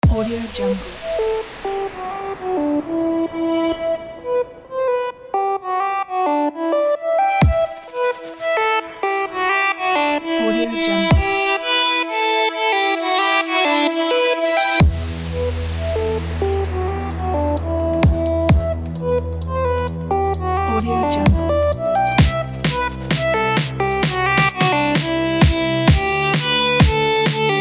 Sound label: active pumping energetic dance edm